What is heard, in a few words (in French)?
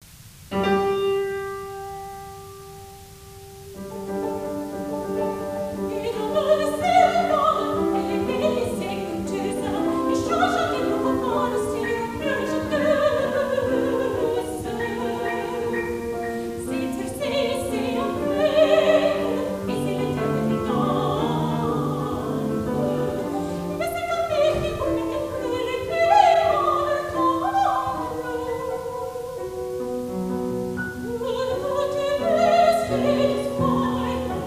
Mandoline